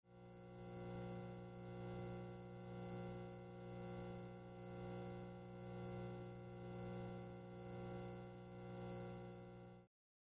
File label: Elect Buzz, buzz & hums